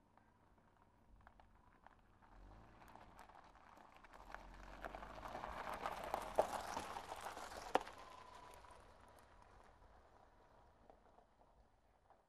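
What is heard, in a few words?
car pulling up on gravel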